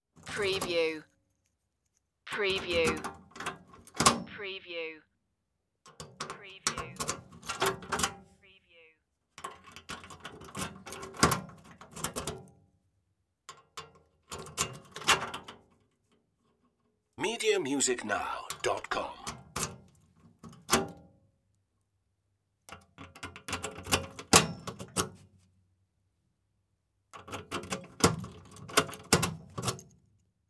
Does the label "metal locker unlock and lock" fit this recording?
Yes